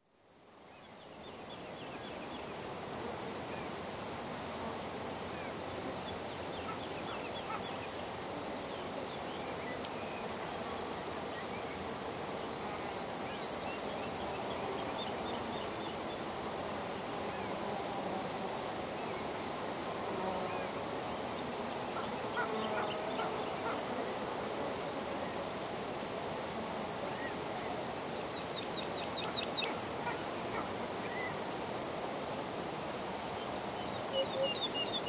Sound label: Cultivated land (semi-arid) in late Spring, midday atmosphere with Woodlark, Booted Eagle, Corn Bunting, Hoopoe, Azure-Winged Magpie, Spotless Starling; grasshoppers & flies, with very distant traffic; BBC